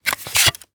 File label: Technology Electronic Cassette Case Remove Cassette